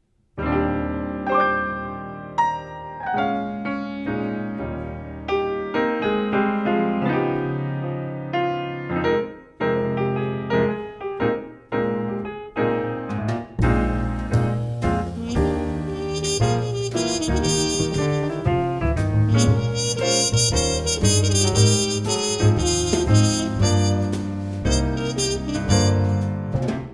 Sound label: Raindrops, Quartett live